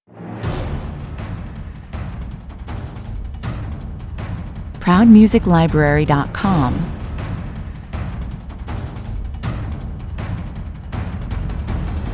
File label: percussion mix